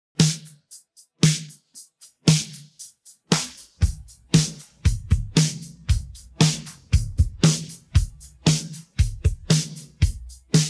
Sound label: pattern, drums